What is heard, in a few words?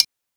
chick; sgi